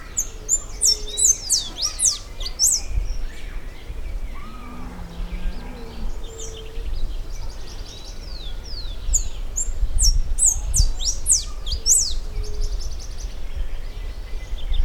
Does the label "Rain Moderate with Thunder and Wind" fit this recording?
No